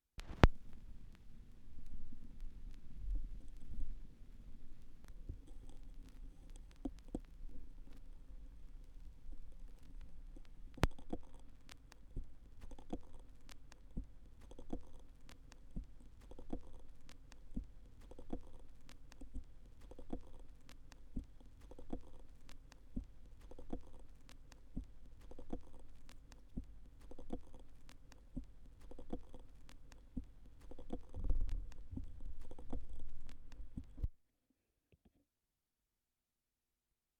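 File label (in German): schallplattengeraeusche, record noise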